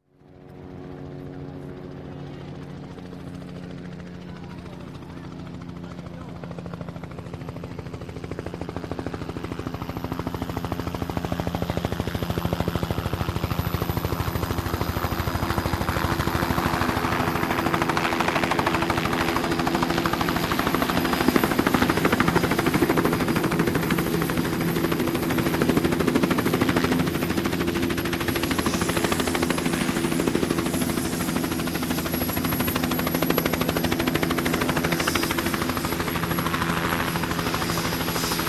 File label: Helicopter Hughes 500e Approach - Land - Idle; Sound Ideas 2000 Series